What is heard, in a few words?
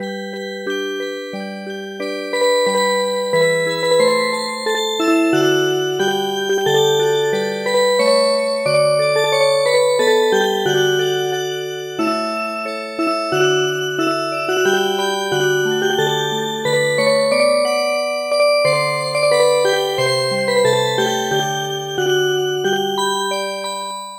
Music Box